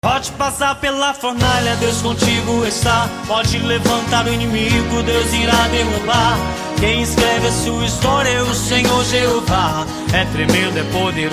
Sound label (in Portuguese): toque